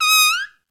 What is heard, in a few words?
SOLO TRUMPET